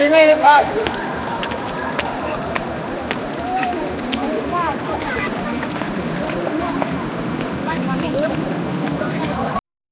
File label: large crowd of chinese pe